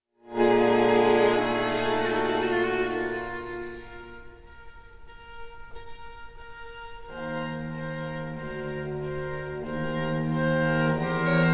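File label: string quartet in B flat major; op 76 no 4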